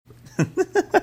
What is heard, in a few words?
short cute chuckle